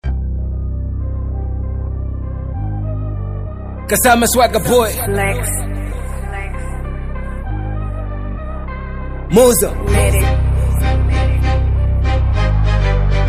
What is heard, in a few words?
Hip-Hop